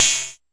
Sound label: Jomox MBrane, drum machines; Jomox MBrane 11